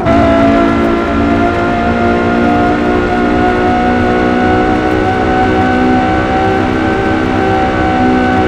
second, vehicles, laferrari, salon; tralalelo ferrari; sim fphys fer laferrari

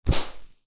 smoke; cappuchino assassino

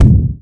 giant step; zoonomaly cat titan

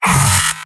soldier pain sharp; mvm

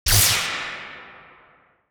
resistance medium; Garry's Mod; tf2

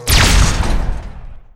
laser; Garry's Mod; omnidroid 16

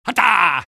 soldier taunt flip exert

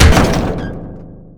bumper car hit; tf2

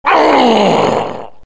knight death; vo; tf2; garrysmod; halloween boss

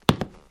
step; brainrot; hamburguesini; noobini burger

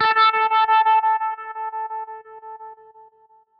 class menu, music; Garry's Mod; tf2

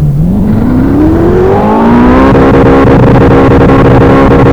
first, vehicles ferrari 488 gtb; autos

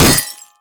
robo impact bullet; physics; Garry's Mod; tf2; mvm

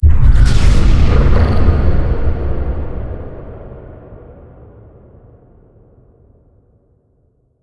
advisor blast; Garry's Mod; outland

